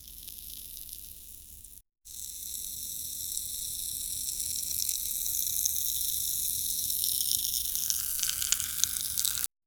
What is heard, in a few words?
chemical